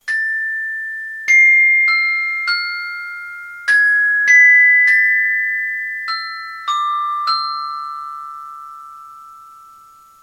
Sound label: Music Box Chimes; Vidar